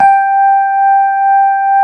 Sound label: SUITCASE, Keyboards Old School; USB Soundscan vol.09; AKAI